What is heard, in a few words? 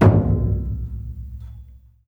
LOUD DRM, BURUNDI; Spectrasonics; Heart of Africa